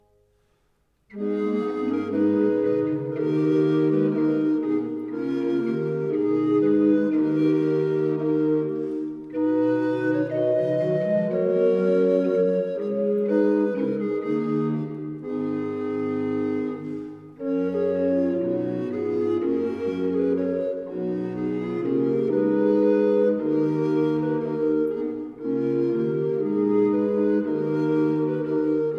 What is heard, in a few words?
Recorder Quartet; Flanders Recorder Quartet